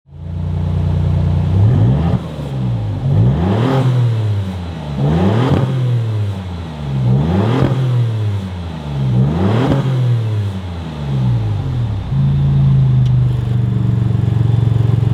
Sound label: Maserati MC20; animation